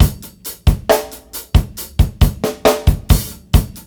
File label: DRUMLOOP, Ragga & Dub Reggae; AKAI; USB Soundscan vol.27; DRUMLOOPS